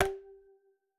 grab

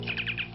communicator; star-trek

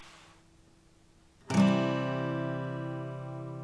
guitar c

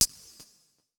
beep

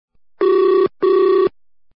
CALL OUT

